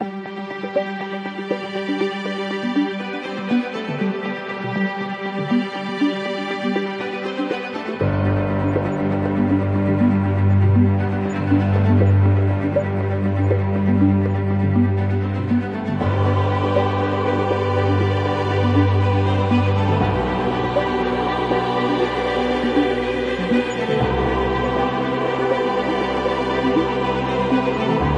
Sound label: Ambient